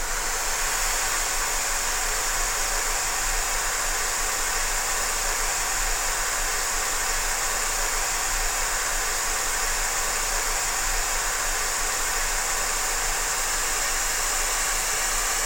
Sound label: air leaking; horror